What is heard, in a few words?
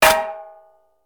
metal hit; firefight